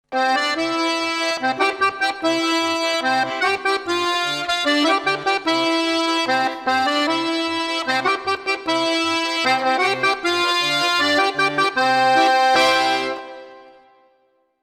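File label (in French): Fete au village Jingle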